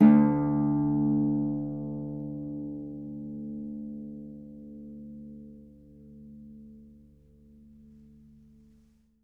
Harp D#2; Miroslav Vitous - Percussion & Harp

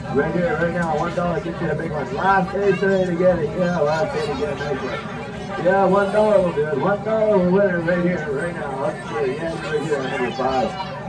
AMUSE, AMUSE PARK, Back Ground; AKAI S6000 CD-ROM - Volume 6

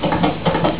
horse; warlords; ST-XX Amiga Soundtracker Sample Packs

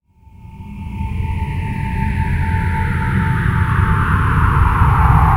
SPACE-FX

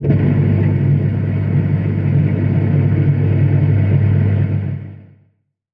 Washing Machine, contact mic, mechanical

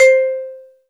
TUNED PERC; Greytsounds VOL-1; LATIN PERC

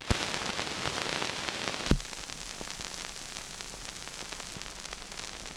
Vinyl Old 78, Noise

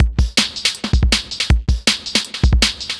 Classic Beat, Drum N Bass

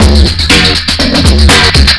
breakcore breaks; killa; bong

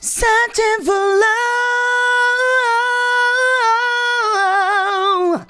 SEARCHIN, FEMALE VOICE; Best Service; Hallelujah

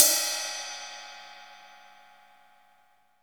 RIDE, Ride Cymbal; AKAI S6000 CD-ROM - Volume 3; MONO RIDE CYMBAL 3

